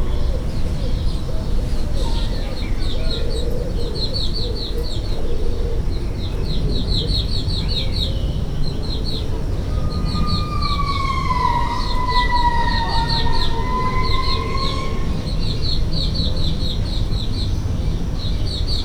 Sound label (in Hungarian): éneke távolról, bóbitás gibbon; gyori zoo 2014